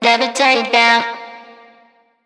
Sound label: phrase Bb, Vocal; Slate Digital Omnivox Vocal Sample Pack